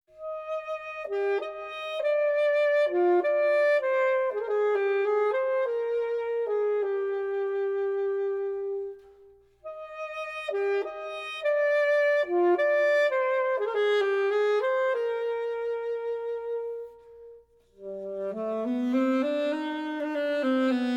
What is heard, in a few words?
melodies for saxophone